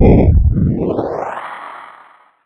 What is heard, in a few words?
hisser, Rumbles